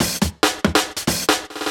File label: Electro Fills